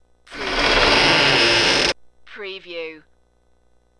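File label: Door Creaks Low; danger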